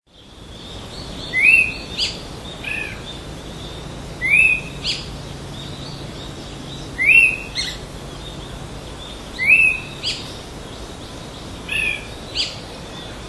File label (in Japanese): akakokko, koe